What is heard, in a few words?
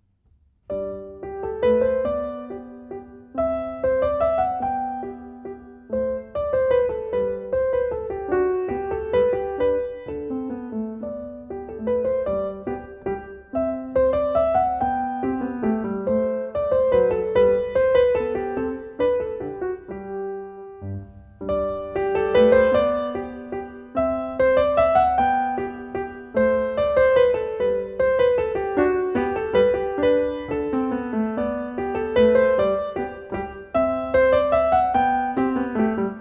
Minuet in G